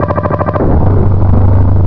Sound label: odd charge; svencoop; coldburn